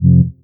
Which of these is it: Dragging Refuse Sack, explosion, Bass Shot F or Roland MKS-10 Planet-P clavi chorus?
Bass Shot F